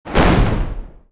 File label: collision Walking Wilds